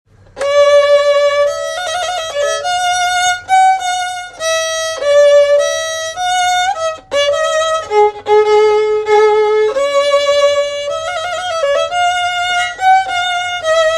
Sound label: Trumpet voluntary; Jeremiah Clarke